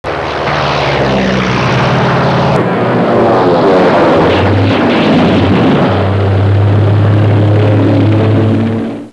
aircraft, spad; warbirds

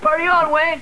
PARTY W

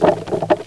RUMBLE D

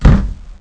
KICK

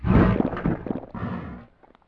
gulp

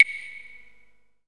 CLAVES

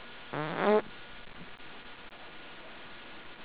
FART

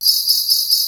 SLEIGH BL; BEAT2000

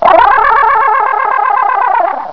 SCREAM; ABUSE

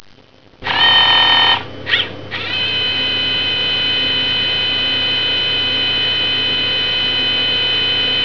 BAND; 999wavs

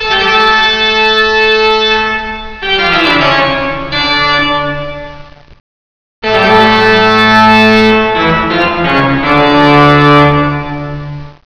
TOCCATA

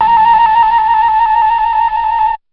FLUTE TRL; BEAT2000

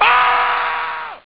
AHHH; Blob

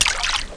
SPLASH

